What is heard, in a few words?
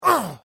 Male Grunt Human Injury Pain Vocal Ugh Ouch